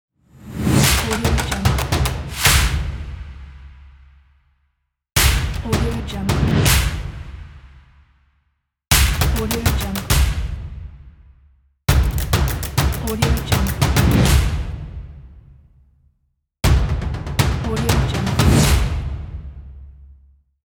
action and epic the end drums pack

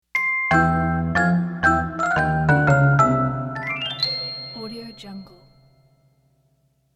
so cute comedy logo

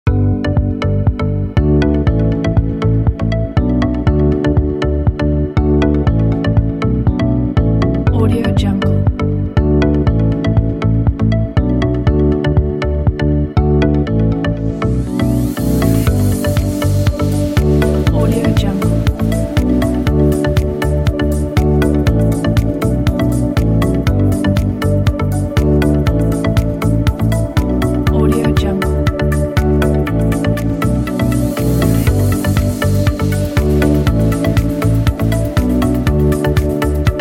upbeat inspiring summer corporate kit

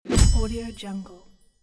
magic poof

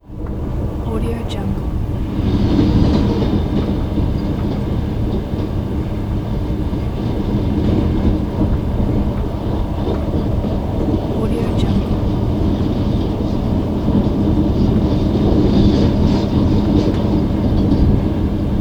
inside in carriage passing by oncoming train

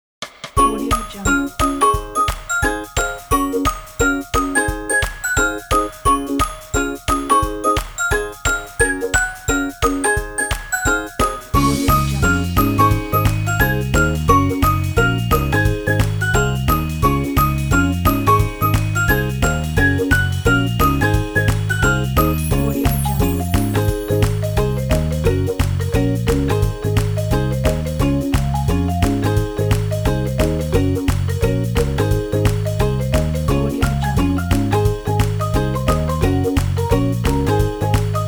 funny happy bells song

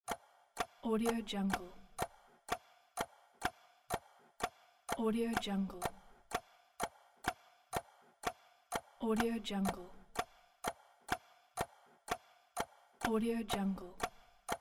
clock ticking

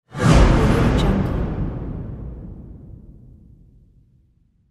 deep whoosh

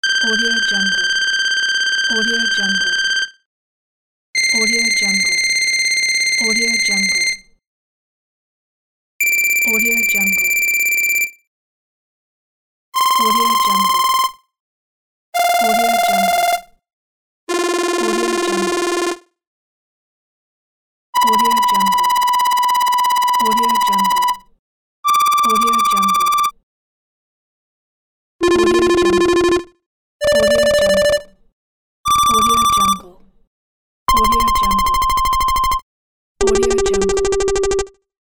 digital text data scroll pack

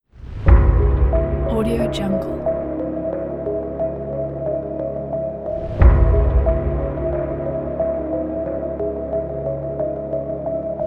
dramatic suspense

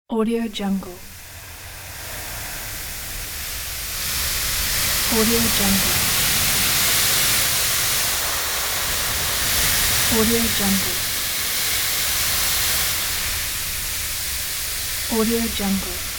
wind sea breeze

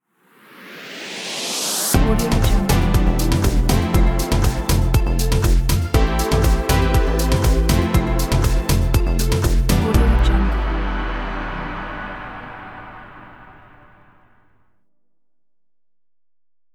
short logo

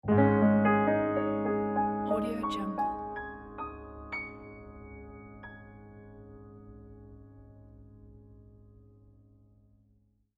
piano logo; piano days logo; stereohive

